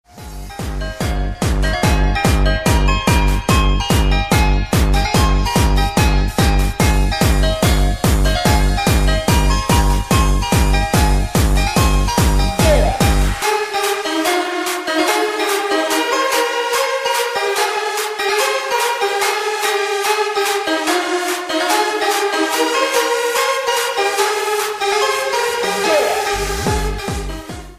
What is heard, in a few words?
Jumpstyle Music